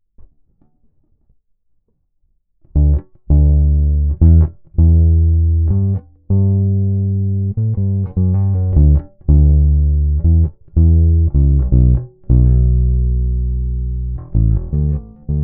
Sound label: bass chorus